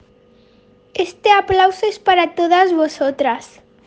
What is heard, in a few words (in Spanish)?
APLAUSOS